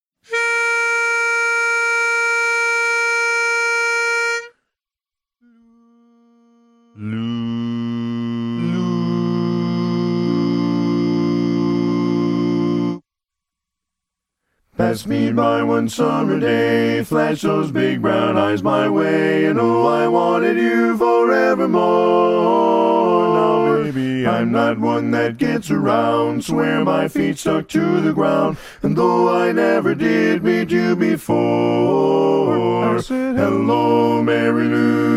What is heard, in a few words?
Lead missing